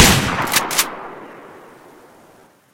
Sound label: mossberg shoot